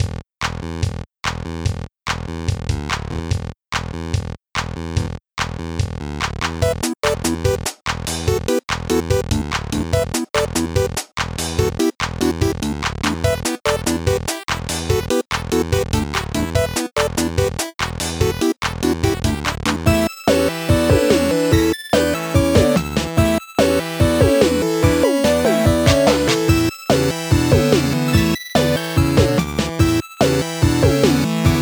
Blues; Run Pigeon Run I II

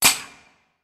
Door Clang; Puddle; puddle plus ios gamerip 2018

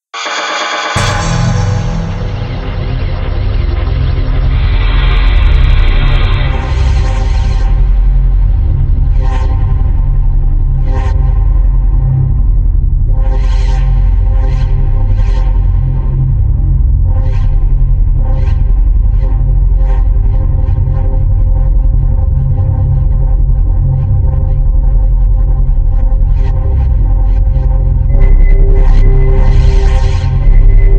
Transmission Sound Effect; Dt D The Goff Transmission Sound Effect

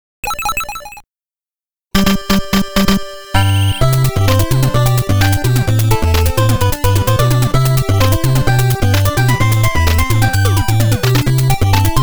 A Rattle Samba (メインBGM); バイオミラクル ぼくってウパ; Konami Antiques Family Computer

